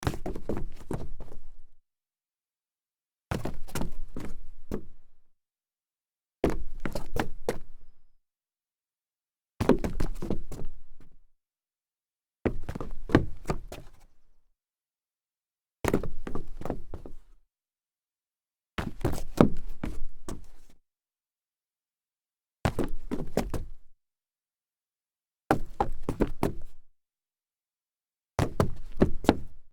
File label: stumble solid wood, foley